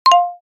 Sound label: Gentle alert; shorts sound effects